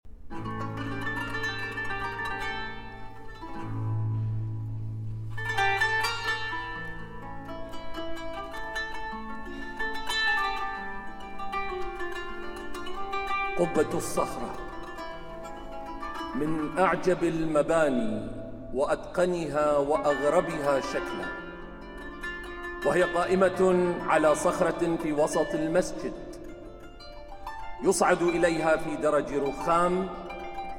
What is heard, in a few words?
Taqsim and Narration